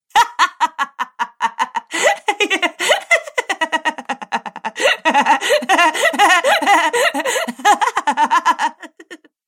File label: Woman Hilarious Laughing; Laughter Sound Effects